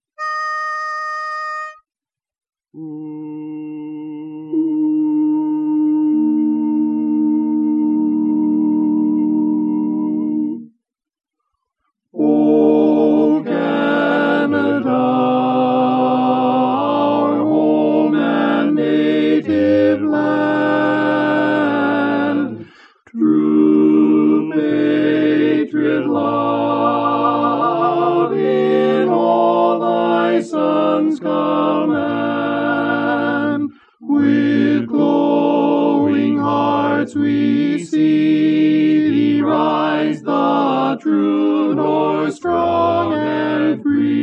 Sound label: O Canada tenor; Barberpole Cat I Learning Tracks